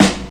good snare sound g key, steel snare drum sound tuned to the G note; snare drum samples